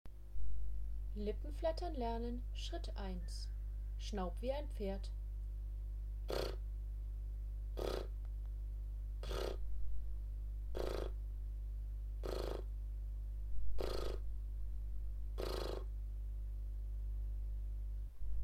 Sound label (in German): Lippenflattern; Lippenflattern lernen Schritt 1